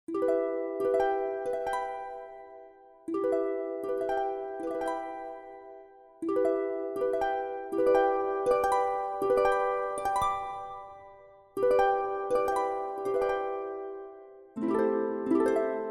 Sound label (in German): kurzes Harfen Solo